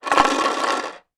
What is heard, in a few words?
toy loose lego peices inside building shake